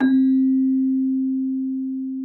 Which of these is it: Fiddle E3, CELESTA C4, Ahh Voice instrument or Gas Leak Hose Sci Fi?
CELESTA C4